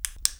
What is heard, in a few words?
flashlight; human; cstrike; D26 slender